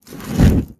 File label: Fire Lighting Burst; SoundsCrate